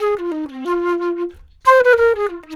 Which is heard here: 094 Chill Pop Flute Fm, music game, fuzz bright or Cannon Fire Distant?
094 Chill Pop Flute Fm